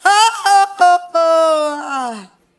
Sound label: "oh oh ho ho" SUNG